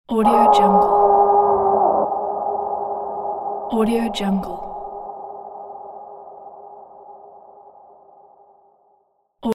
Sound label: growl in pain